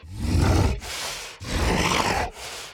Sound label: Walk Cycle Burns; Devour; The Town